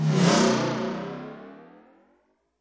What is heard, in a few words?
startup stretch; Weird and Interesting Hits; single hits